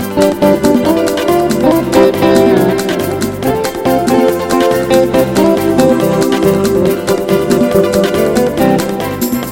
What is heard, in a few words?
Great Fast Samba